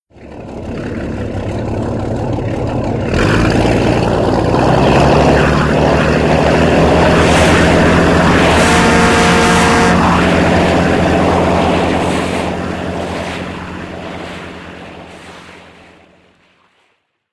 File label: waterfront intro boat; Left 4 Dead 2; Source Engine